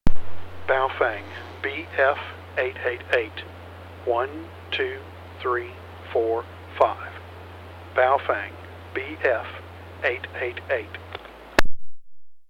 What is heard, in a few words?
baofeng bf888